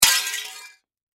Crash Glass Pane Break Drop Small Crash Debris; Breaking; Blastwave

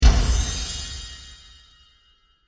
Magic Explosion; Magic Sound Effects

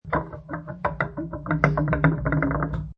Lamp Rattles, Lamp rattles on wood.; Lamps; Household

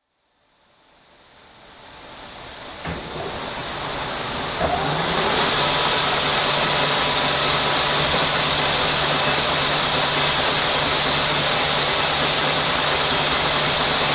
Mayer circular fleece-knitting machine; Machinery; Machines; BBC

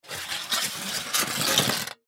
Metal Sheet Bend Tear Rip; Materials; Blastwave; Foley

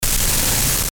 Distortion Static Burst Glitch; Blastwave

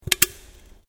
Can Aluminium Inside Bend; various metal cans; Metal (Sound Effects:Materials)

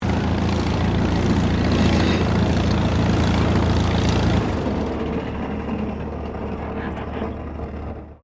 AIRCRAFT DH82A DEHAVILAND TIGERMOTH TAXI SHUTDOWN; RAAF Tigermoth; Airplanes; Vehicles

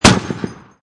MkII 50cal Single; Machine Guns; VRSonic

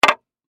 Wood Plank Down, Set, Down, 2X6; Wood (Sound Effects:Materials; BlastWave

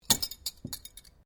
cutlery general place cloth, Household cutlery; Kitchen

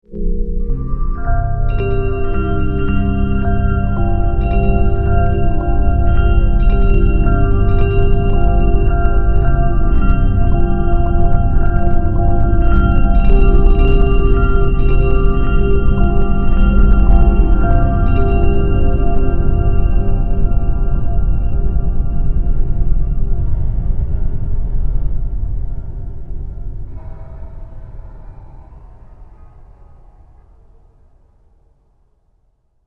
Synth, Stab, Drone, Horror, 5.1; Chimes, Creepy, Horror, 5.1; BlastWave

